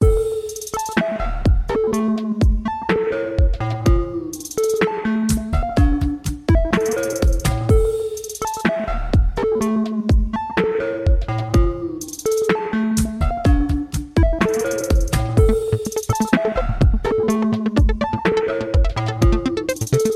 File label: Top Ringtones Samsung Galaxy